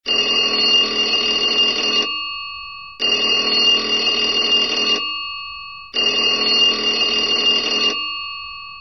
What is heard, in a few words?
alarm